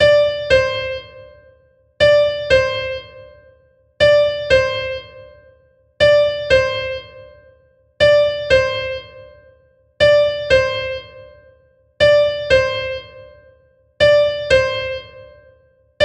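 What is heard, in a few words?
long appoggiatura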